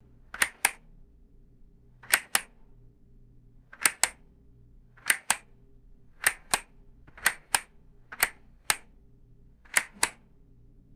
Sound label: plastic light switch clicking on and off; mechanical